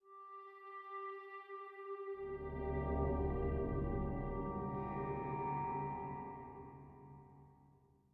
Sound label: Escape 95bpm; battlefield hardline; ps3 ps4 windows xbox 360 xbox one